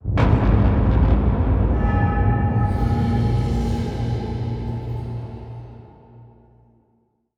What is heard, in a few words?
Stinger; PlagueOfMadness